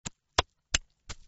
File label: faster slappin